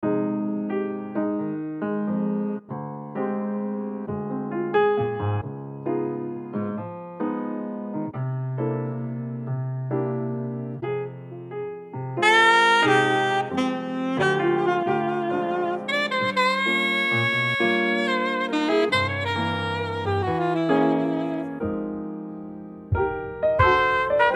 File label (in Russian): Мягкий Музыка для работы За работой